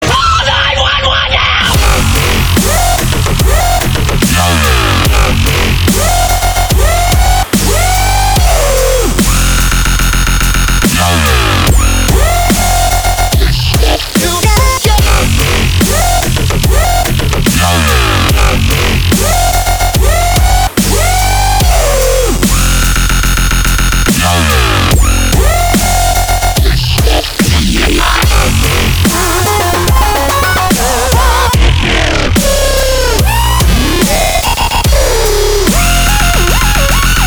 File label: Dubstep